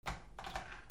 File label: PHONES